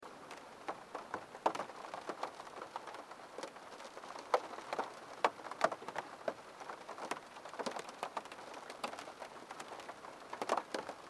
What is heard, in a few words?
Rain on window sound